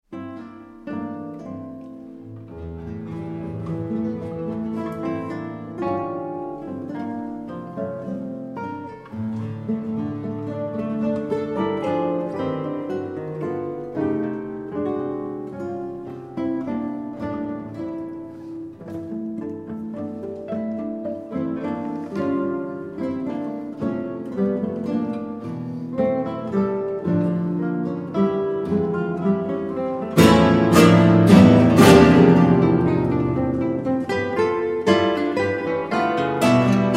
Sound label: concerto for four guitars and guitar orchestra, grazioso